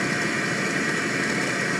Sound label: TV Static Loop; SFX Kit; Foley - Misc